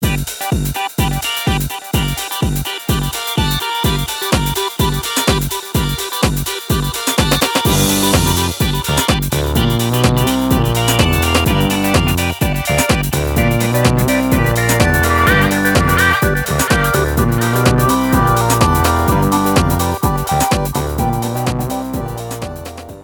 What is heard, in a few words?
house